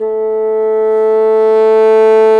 Bassoons; Roland LCDP04 Orchestral Winds; 90 s Sample CDs

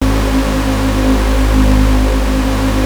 PROPHET 5 G#2, Synthesizer; Hollywood Edge - Giorgio Moroder Rare Synthesizer Collection

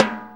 Snare B; Sonic Foundry (Sony Creative Software); Drum Tools

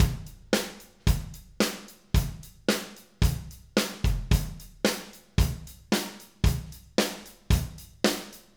Acoustic Live Drum

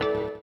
orient, bizarre guitar; Spectrasonics

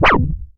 MEMORYMOOG

